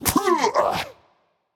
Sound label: clear throat; smite; voicepack; odin